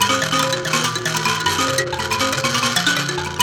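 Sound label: PLK Bass Mbira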